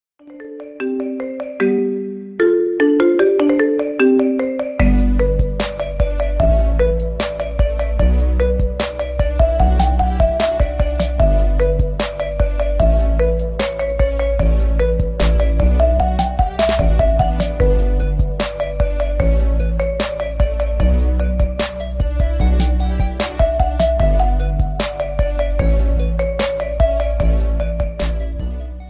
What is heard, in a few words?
marimba ringtone remix